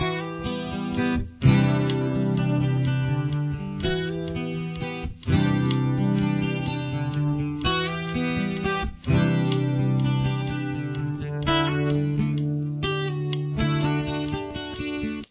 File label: guitar solo